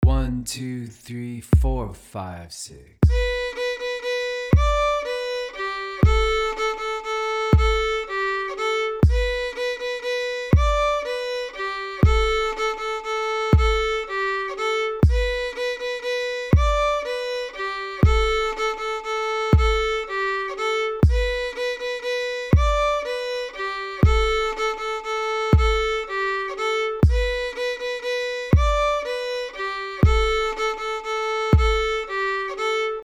Jig Variation, fiddle; kesh; B part 2nd quarter

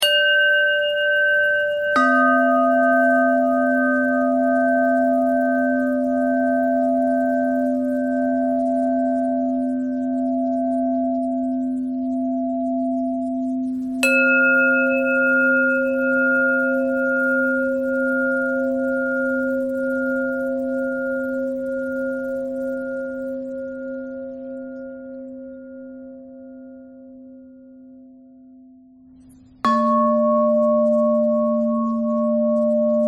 bell bend threads

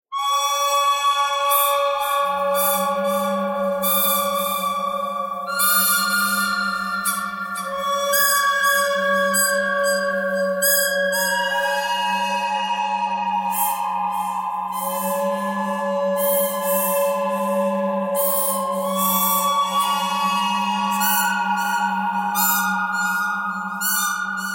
bird canyon bird thread pentatonic

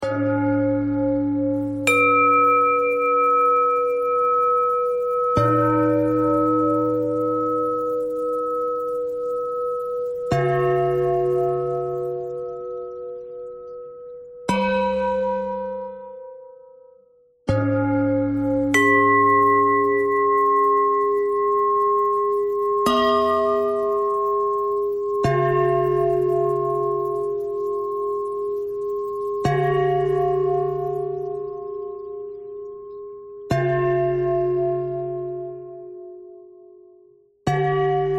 bell thread bend thread

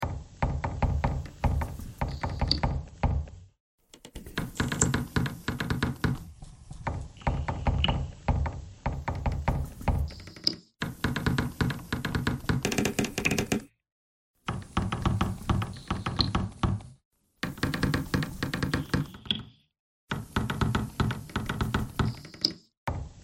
bagpipe thread noise

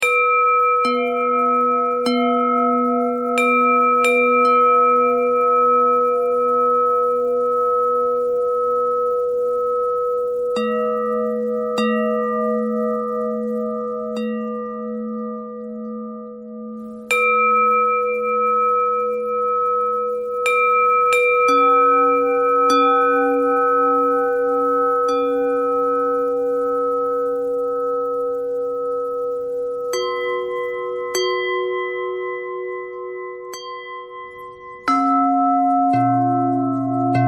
bell thread pentatonic

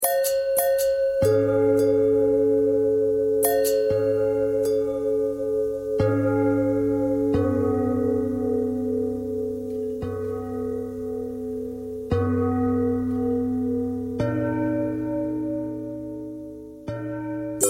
bell long bell thread noise, bells

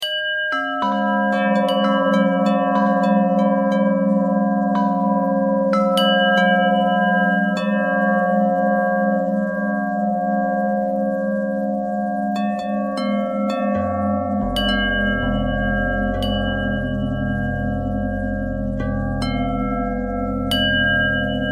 bell bell thread pentatonic, bells

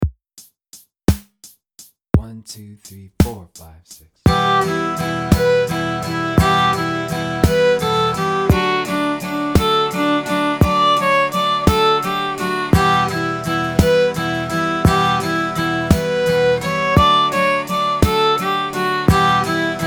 jig full mix 75bpm

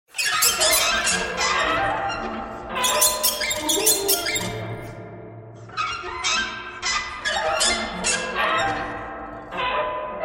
birds

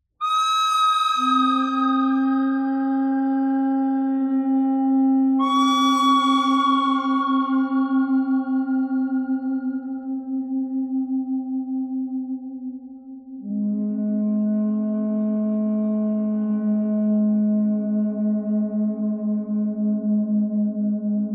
bird thread pentatonic; noreverb

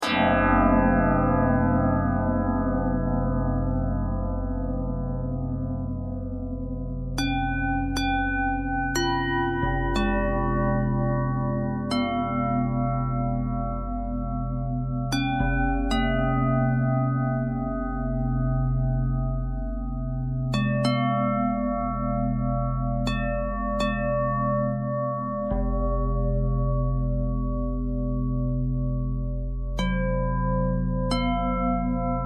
bell harmonic twist, bells

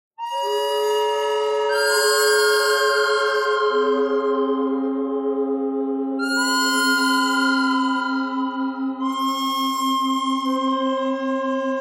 bird thread harmonic; noreverb

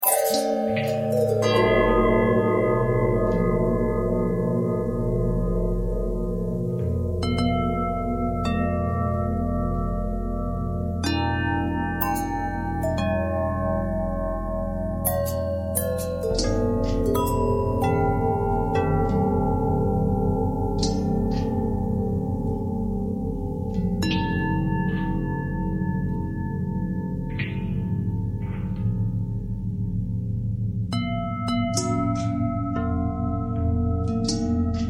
bell long bell bend twist, bells

